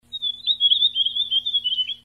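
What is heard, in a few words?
Pinicola enucleator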